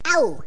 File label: auw; Raft Wars